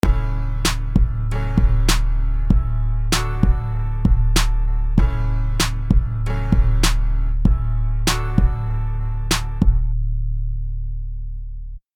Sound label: melodies